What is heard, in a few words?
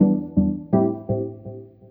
E PIANO 125, Keys; Houseworx